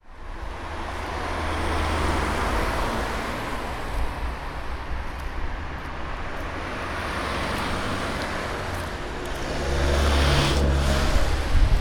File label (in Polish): Miasto, ulica, ruch uliczny; car mechanic simulator 2014